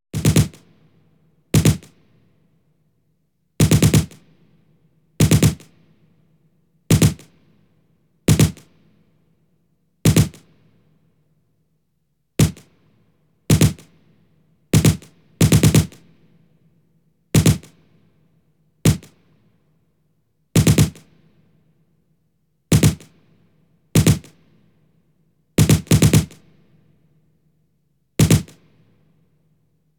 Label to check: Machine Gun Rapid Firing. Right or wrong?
Right